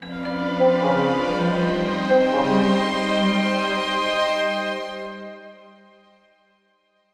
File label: Stinger; Raza the Released